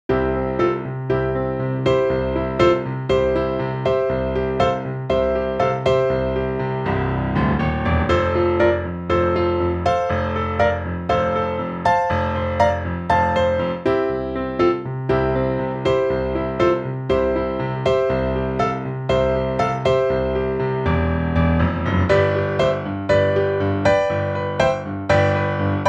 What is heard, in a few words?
IV-chord Bump Exercise